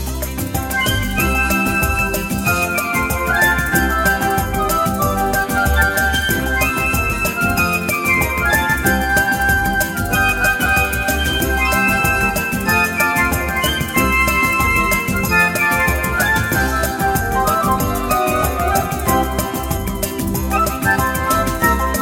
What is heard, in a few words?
Fast Bossa Nova